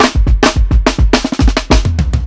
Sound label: 105 FILL, DRUMS LOOPS; BASEMENT DRUMS VOL 2